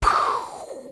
gun rifle

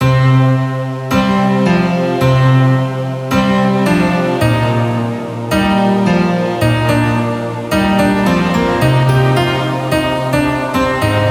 Dark Blue Short Loop

